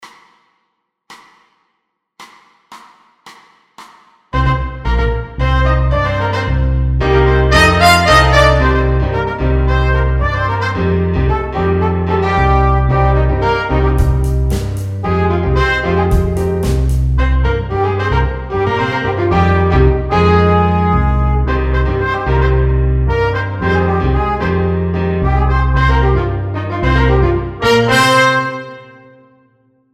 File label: Jazz Funk Etude No Drums